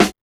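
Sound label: SD, snare drum; X-Static Goldmine 1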